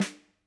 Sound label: Snr, drum